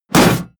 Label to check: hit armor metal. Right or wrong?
Right